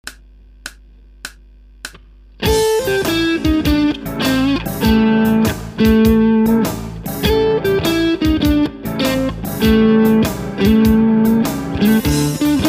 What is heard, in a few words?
Blues Etude